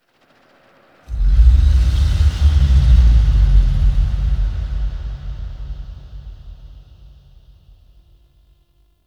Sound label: Exhale Large; Ambience; Warframe; Sentient Lair